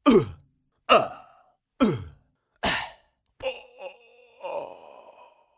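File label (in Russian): звуки драки людей и удара кулаком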